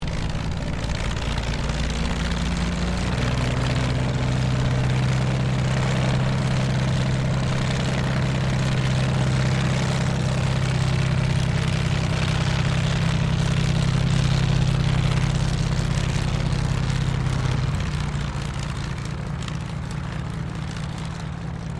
AIRCRAFT CT4A IDLE TAXI, military trainer aircraft; Airplane; Vehicles